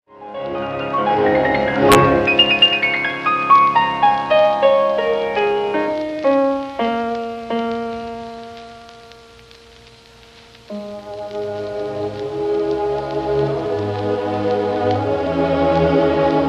Piano Concerto in A minor, Adagio; Grieg; Op. 16

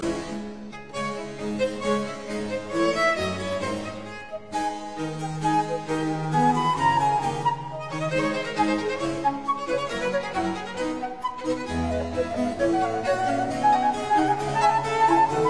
Quatuors Quartet Allegro; Quatrieme livre de 6 quatuors; Quartet No 6 in D minor; TWV 43d2

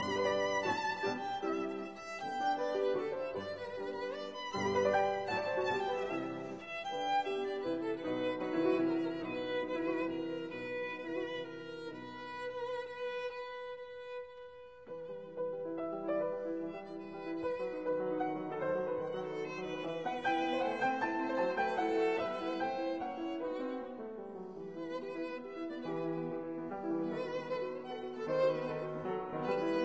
Violin Sonatina in G major Allegro risoluto

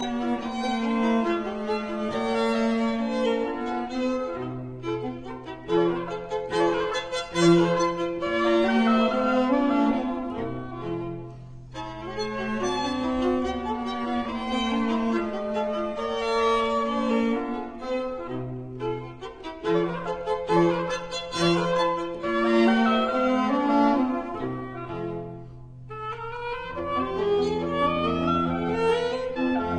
arr for oboe and string trio Allegretto; Piano Trio No 1 in G major; K 496

